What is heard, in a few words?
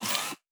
servo small, sci-fi sounds, mechanical